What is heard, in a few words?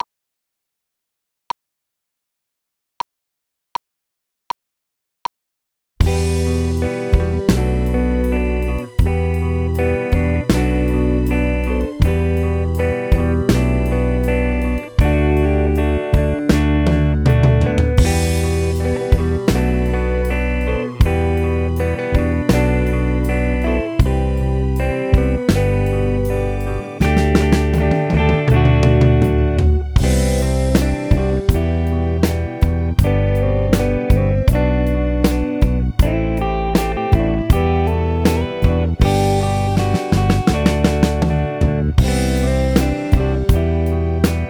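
Guitar Drums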